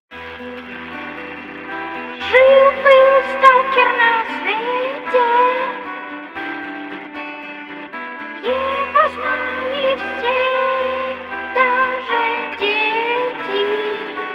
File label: radio music; STALKER